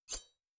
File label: woosh